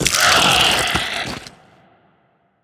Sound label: worm death small, creatures; Factorio